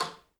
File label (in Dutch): Schakelaar